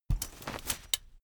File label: umbrella big close full hard